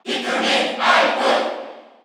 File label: Cheer Korean; Alph; SSBU